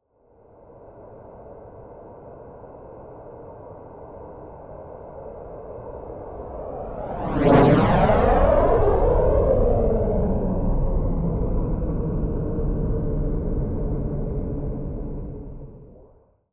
military jet eurofighter typhoon flyby left to right fast close; flugzeuge jets; verkehr transport